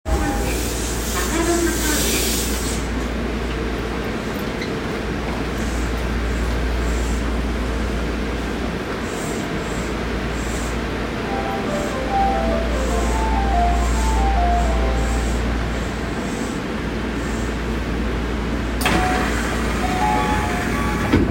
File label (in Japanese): 都営大江戸線中野坂上西, train sounds